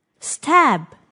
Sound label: stab